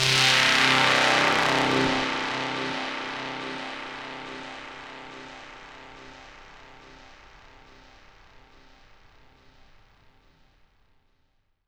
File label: Tonal FX C